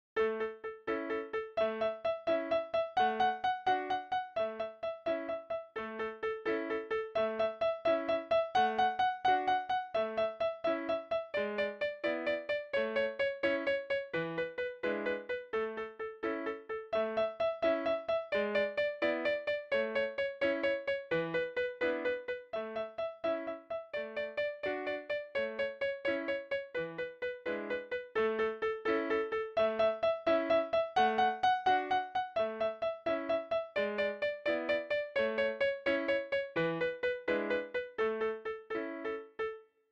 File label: Twinkle Var D